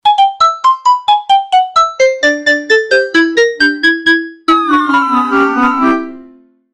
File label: quiz show jingle, game show